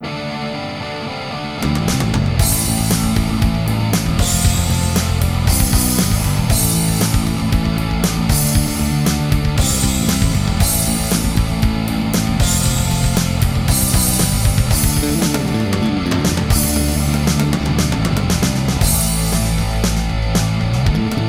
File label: karaoke